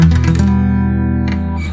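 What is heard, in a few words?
guitar strum; misc; Sonic Foundry (Sony Creative Software); Ugly Remnants VOL-2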